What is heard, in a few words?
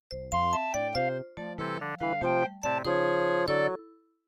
MIDI Animation stings